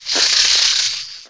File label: attack; mahlendur